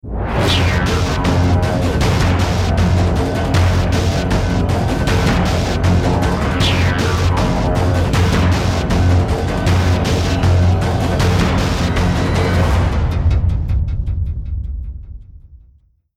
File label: start action, music